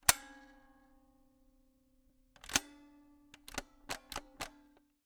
Camera, Kodak EK2 Instant, polaroid, large, plastic, springs, snapshot, hold, release, fiddle; Eiravaein Works; Vaeyan IV